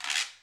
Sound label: Guiro Wood; Club-50 - Foundations Roland